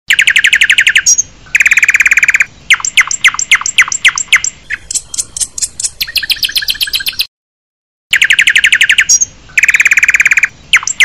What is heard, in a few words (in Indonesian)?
masteran untuk murai dan kacer; versi asli